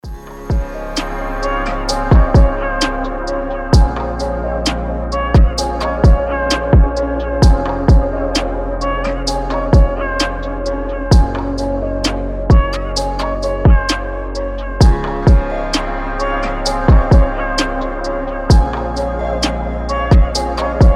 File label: type beat